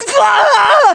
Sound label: pain; krillin